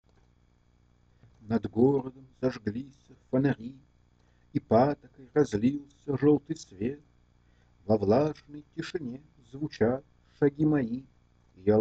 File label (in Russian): pianino, voice